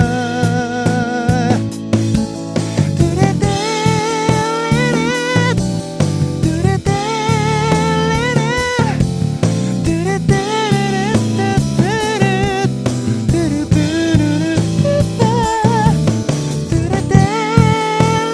bass

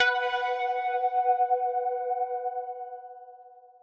pad

